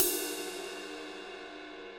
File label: 20 inch crash ride ping, cymbal; Dream